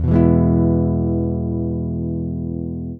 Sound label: chord